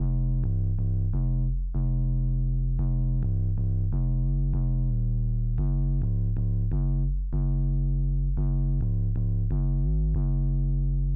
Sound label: Freestyle Bass 172bpm A min